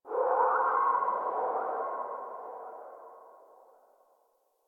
Covered Vine Area Low Chirp; Ambience; Misc Outdoor; DE; Warframe; Infested Eris

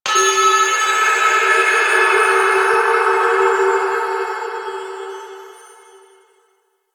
Breathy Bell; Ambience

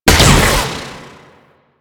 Shield Lancer Pistol Alt Fire; Warframe; Lasrian; Lasrian Soldiers; Weapons

